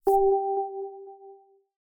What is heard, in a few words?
drop